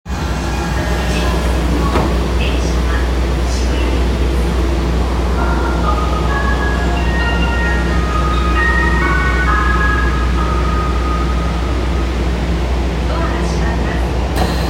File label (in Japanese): 銀座線稲荷町駅西, train sounds